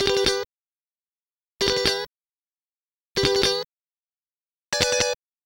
Guitar Licks

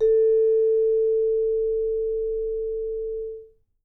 A4, Percussion & Harp; Miroslav Vitous

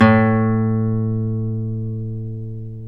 Guitar Classical A2, Nylon String; Roland LCDP02 Guitar and Bass

